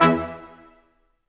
BRS Quintet staccato; Roland L-CD702

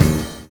FAT STAB; Univers Sons - Kitch 70s

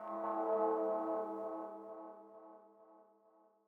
Twisted FX, Old Skool House, Belgium Beats 132bpm; Big Fish Audio - Old Skool House; OSH Construction Kits

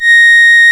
REED A#; Big Fish Audio; Synclav FM

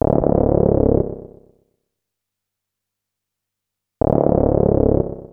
HUMPM, FX, Analog; USB Soundscan vol.49; Analog Fantasies; AKAI

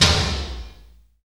TOM FUNK; Roland LA; DRM Toms

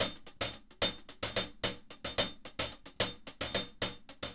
Hi-Hat Groove; Hi-Hat Grooves; Sonic Foundry (Sony Creative Software); Vital Drums

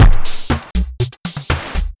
Beat, Drums, Eclectic Electronica

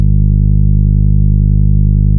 TAURUS A2, synthesizer

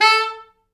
A3, Pop Brass; Best Service ProSamples vol.19; AKAI

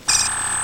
HELMET SOUND, Science Fiction; E-MU Producer Series Vol. 3 – Hollywood Sound Effects; Mower Man

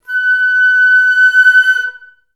FL CRSC F#5, Advanced Orchestra; Best Service

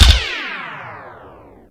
WAVEDRUM; Back In Time Records; Big Bang

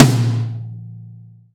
Roland XV-5080 Tom

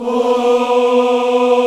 VOX, African Aahs; Roland LCDP11 Africa VOL-1

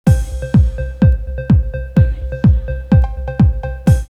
drumloops Techno House 126; Cool Runnings; X-Static Goldmine

